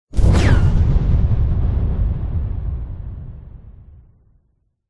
warfare missile bomb incoming whoosh into large explosion